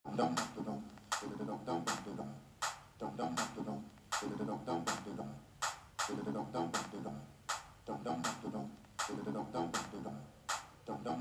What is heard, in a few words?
beat